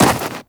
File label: Run Snow; Footsteps; Warframe; Tenno